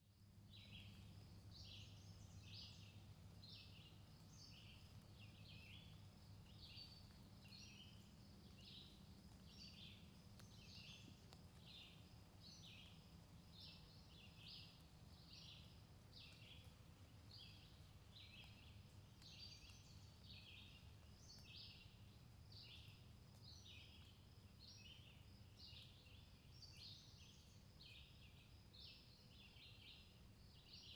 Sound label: nature woods birds